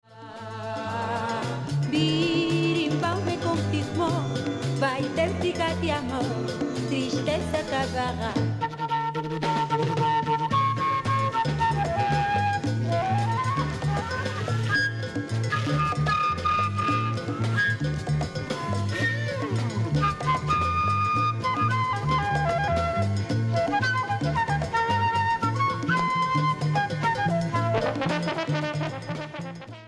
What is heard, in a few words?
Bossa Berimbau